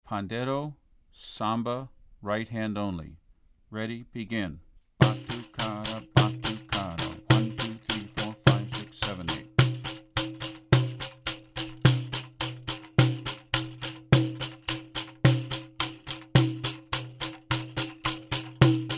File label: Samba Right hand only